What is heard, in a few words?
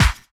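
snare drum bass drum, Dance Mega Drums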